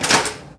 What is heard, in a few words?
hatch open; doors elevators; Star Wars Obi-Wan; XBOX